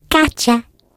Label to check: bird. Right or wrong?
Wrong